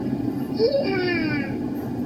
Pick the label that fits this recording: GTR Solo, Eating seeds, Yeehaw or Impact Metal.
Yeehaw